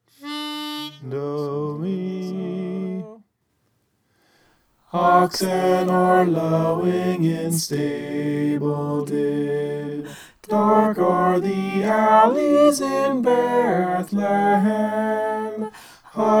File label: soprano